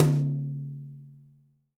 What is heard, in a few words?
brush hi tom, gretsch; Dirt-Samples